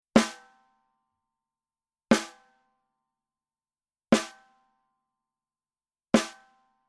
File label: SNARE Power Center Center Hard